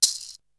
Shake, Drums; ZERO G - Datafile DISC 3

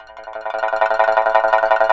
SNXN TRML A3, Heart of Asia; Spectrasonics

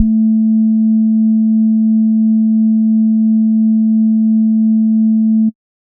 SBASS A2, Sub Bass Sine; neuro; Stanza

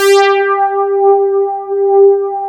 RUST BASS G4, MKS 70; Northstar - New Gold VOL-1

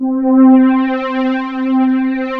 JUNO STR; USB Soundscan vol.49; Analog Fantasies; AKAI

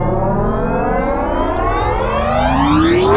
X MACH, MACHINES, Production Effects

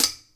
Hi Hats; InVision Interactive; Lightware VOL-1

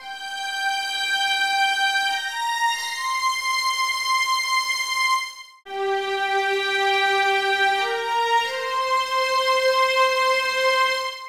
Strings, 70s Analog Funk; Sonic Foundry (Sony Creative Software); What it Is! 70s Analog Funk; Session 15 - Wild Child

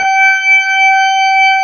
ROCK B3 D, HAMMONDS; East West; Global Sounds

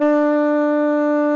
tarogato D3; SoundBytes - Early Patches

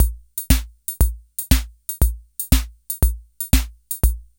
SHUFFLE; INIS; Funky Xtreams

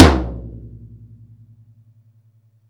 TOM D, Drum Dry; East Collexion; Drum 1

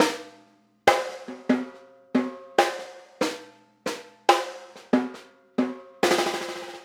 Snare Pattern, Drums; Sonic Foundry (Sony Creative Software) - Essential Percussion

